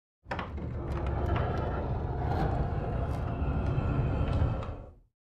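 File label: Dresser Rolled On Wood, scrapes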